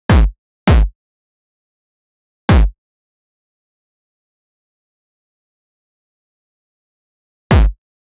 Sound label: set of modern platformer, jump